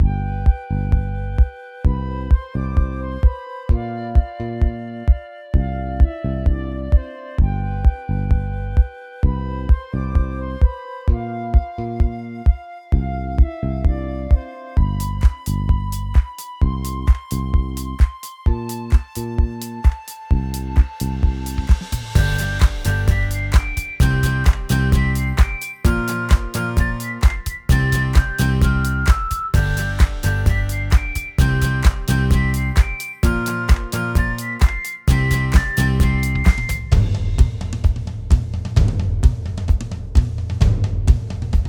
ending music; Talewind

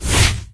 Vehicles Hoverboard

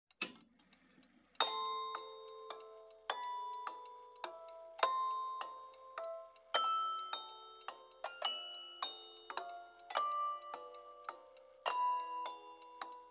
Musicbox Long; Pineview Drive

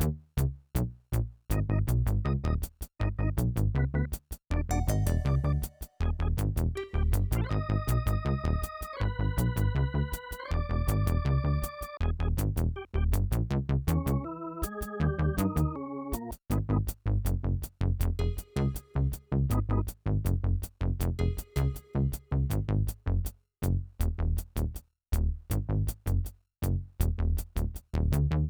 Fight B Low

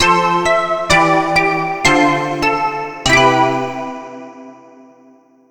Jingles Inn

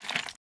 flipping

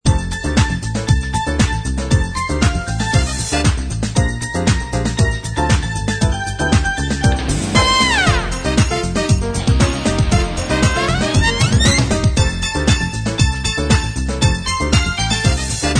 Red Hot Jingle Bells; prep landing ios gamerip

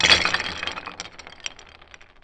Killing Blow Slow; lego ninjago rise of the snakes

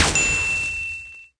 Swish

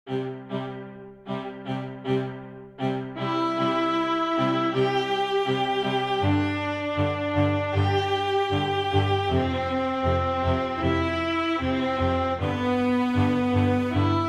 merchant loop; warriorb linux switch windows xbox one gamerip 2020

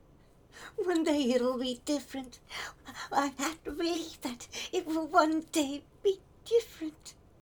Male Idle

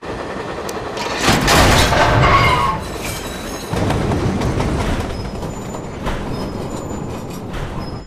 train boss defeated

